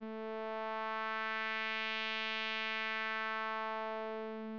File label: pulse sub